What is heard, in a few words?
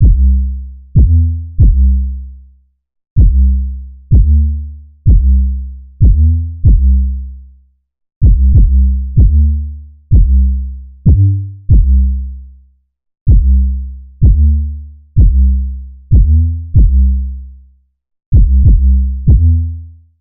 808 loop 95 C; Slate Digital BASSHOLE Sample Pack